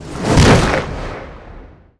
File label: whip